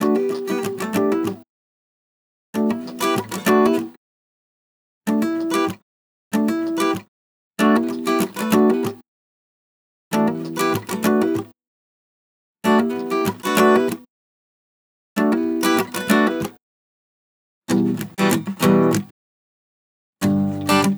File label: Magestic Guitar Loop BPM 95 E Min; Bihu; waves of bihu vol 2; Gully Loops